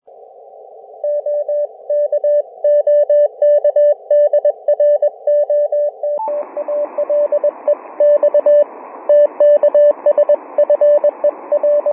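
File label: 40 CW SSB not antenna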